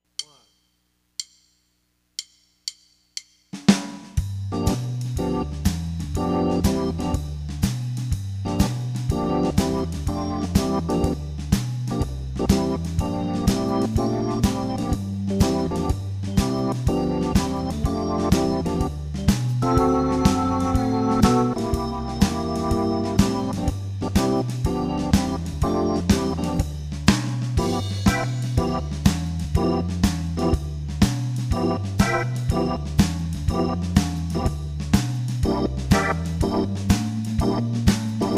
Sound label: XK-3 w Drums